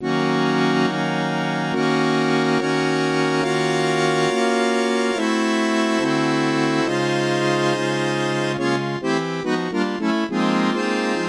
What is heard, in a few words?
4 harmonicas; Synthesized audio for Study